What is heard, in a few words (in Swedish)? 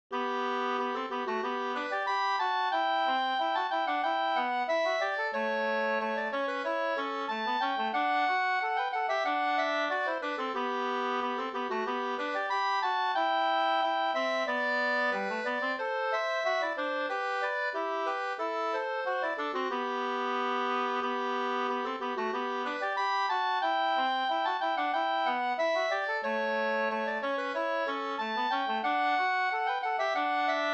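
brudmarsch Hango brudmarsch Finland 2 stämmor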